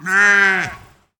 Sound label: Sheep Baa